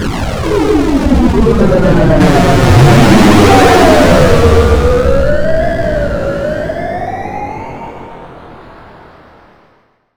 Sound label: Retro HiTech Long